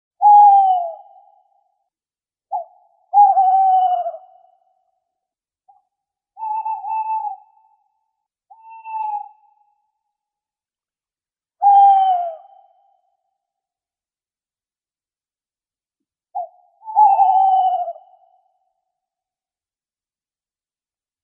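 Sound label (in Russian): неясыть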